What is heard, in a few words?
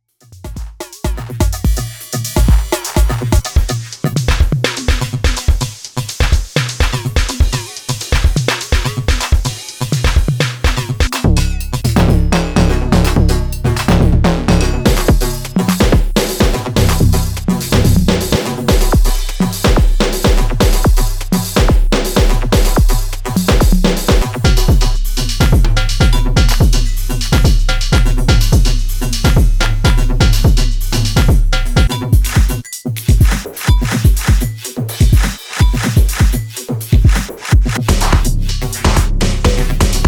Roland TR-8S TR-6S Random Kit; Firmware Update V2.5